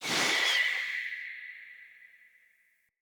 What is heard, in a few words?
Bow Arrow By Charged Echo; Weapons; Player; Bows; DendritBow